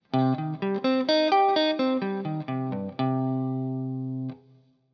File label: C Minor Arpeggio Shape, guitar